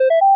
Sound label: session start